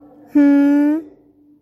hmmmm

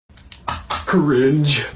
cringe sound

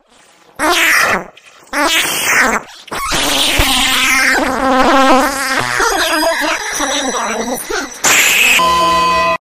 donald duck scream

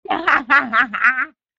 laugh

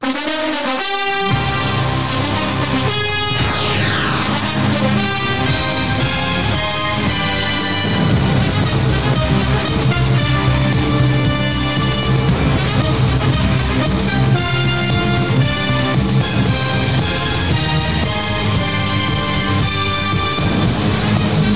nfl football music

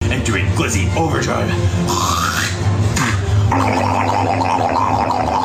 alert

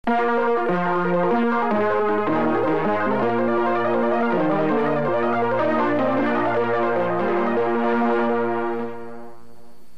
fanfare logo

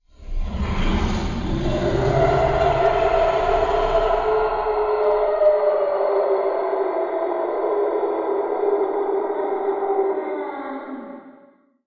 long roar; wendigo

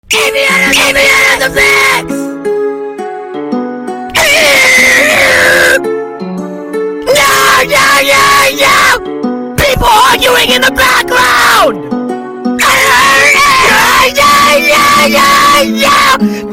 screaming the lyrics

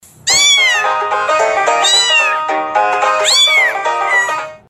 banjo diffuse

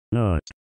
button

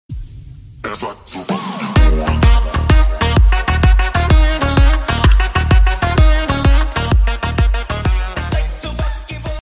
donation song; twitch

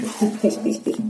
loop march

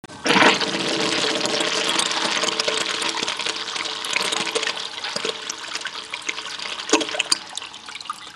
water bubbling

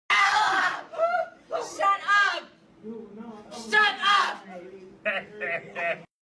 laughing; plankton

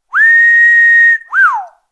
wolf whisttttle

